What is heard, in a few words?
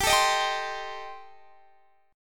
D sharp 6th Flat 5th